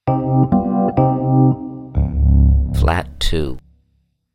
Flat2 Rhoads Mark1 Minor Degree Name; ear training instrumental color

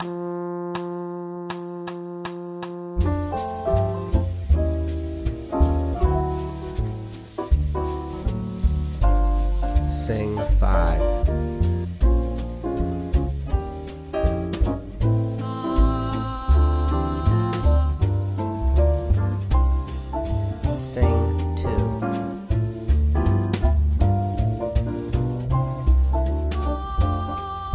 Jazz Alto; jazz standard ear training

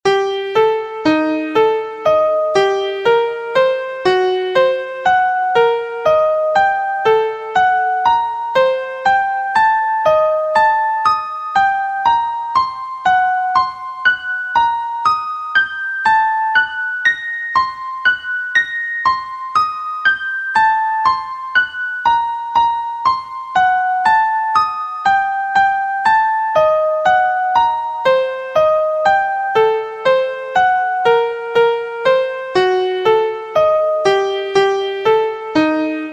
Degree 1 2 b3 4 b5 6 Atomic Scale Key Eb; harmonic and melodic equivalence; two triad pair

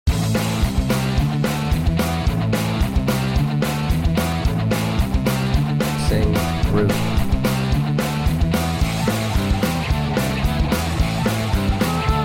Alto Key of A, punk music; punk music ear training; Practice Perfect

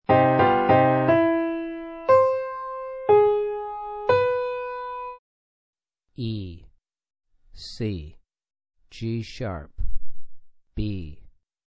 Piano Min e3 c4 g#3 b3; ear training four note melodic

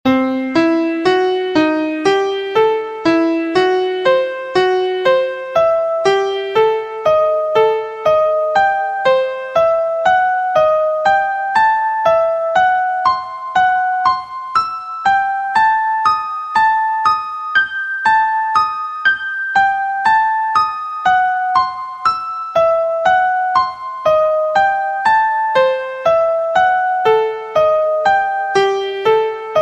Degree 1 b3 3 b5 5 6 Atomic Scale Key C; harmonic and melodic equivalence; two triad pair

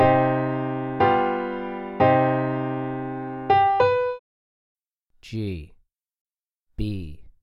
2 Note Mel Min g3 b3, piano; melodic ear training

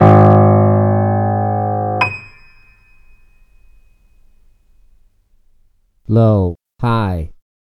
Low High Extreme g#0 d#6; total beginner ear training

